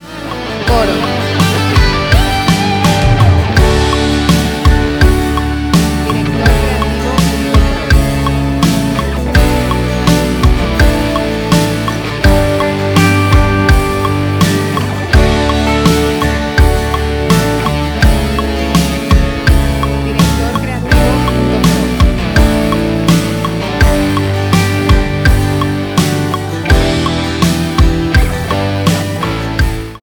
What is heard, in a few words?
83bpm 4/4 C